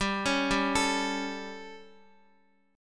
G Suspended 2nd Flat 5th G, Guitar, 4F, 4A, 5C, 5G, 5C, 6E; arpeggiated; x,x,7,6,7,5